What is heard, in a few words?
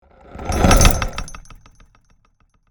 Industrial equipment metallic squeak